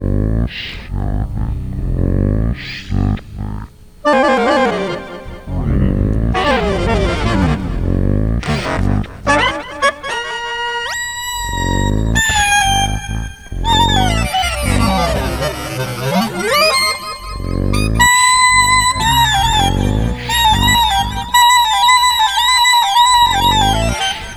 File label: saw